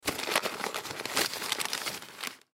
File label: Briefcase Bag Rummage Through Papers Foley; Miscellaneous Office; Blastwave